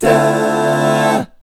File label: guy chords, vocal, jazz; ILIO; Vocal Planet VOL-3; Jazz & FX